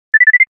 flutter; apple watchos 11

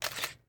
camera click, telefona poco

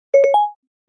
ios18 siri interrupted; Системные звуки и уведомления iOS 18 (iPhone 16, 16pro, iPad)

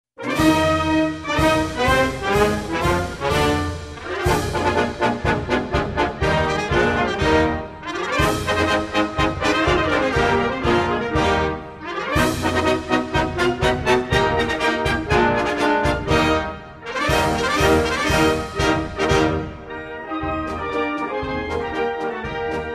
anthem

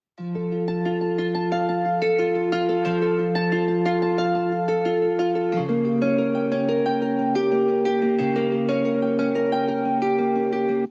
zte brocken chord

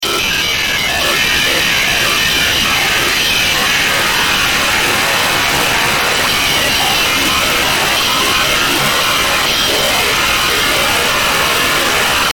rush footsteps; doors

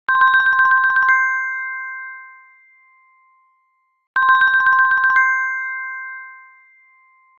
galaxy watch classic

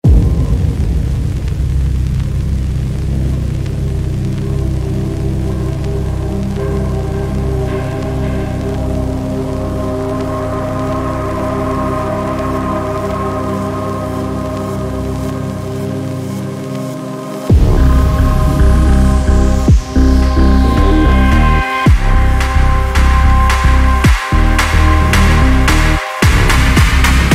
electro insanely evil

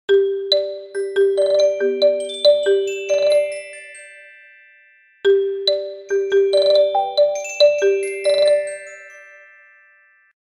interesting, zvuki telefona infinix

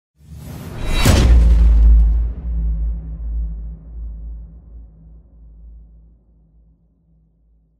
impact

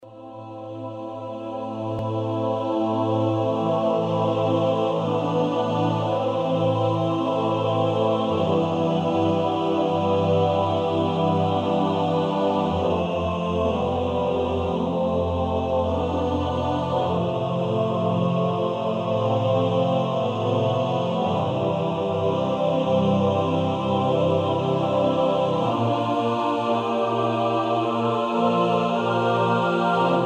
angel horr

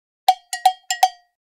allegro; ZTE Nubia; Рингтоны смартфона ZTE Nubia, мелодии будильника по умолчанию и звуки уведомлений

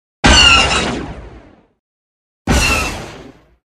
blaster; imperial

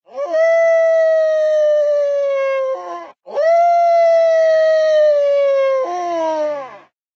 howl

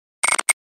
reverse charge, nothing phone

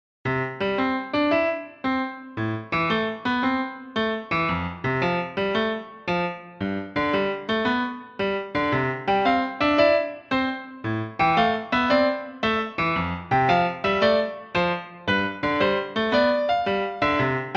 encounter; tecno

